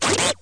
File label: chatty creature